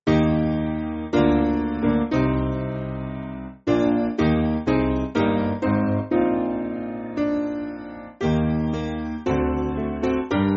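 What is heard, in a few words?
Piano, Music Backing Files for Churches; O Canada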